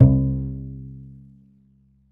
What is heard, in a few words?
Upright Bass C, Lo-Fi; One Shot; Ghosthack; Golden 94 Dm; HipHop